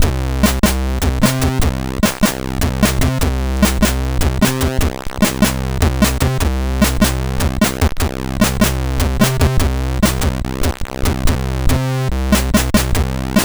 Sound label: sid, CSG8580R5; Trogue64 Preview